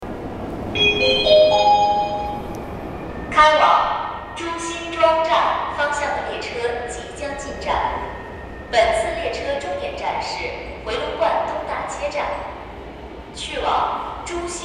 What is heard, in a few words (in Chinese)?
8号线 回龙观东大街 回库车 进站